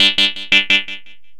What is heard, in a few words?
SYN LP, 170 BPM; Ueberschall; Drum & Bass Flash Trackz